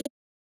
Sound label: desktop screen unlock; stereo